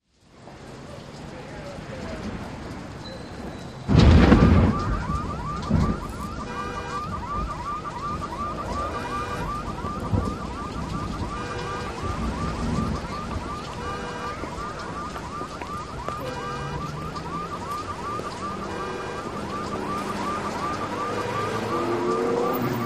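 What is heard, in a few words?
Thunder Crack Car Alarm, urban thunder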